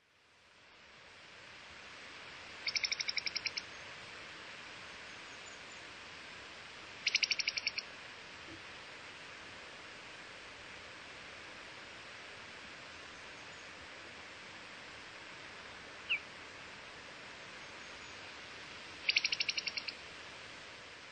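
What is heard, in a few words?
Hazel Grouse, doto; koe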